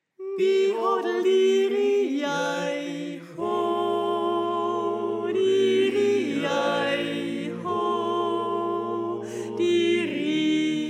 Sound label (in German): Sopran